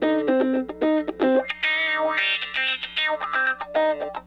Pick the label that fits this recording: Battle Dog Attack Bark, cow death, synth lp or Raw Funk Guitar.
Raw Funk Guitar